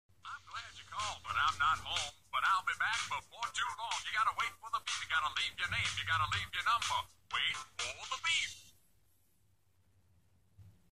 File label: disco ringtone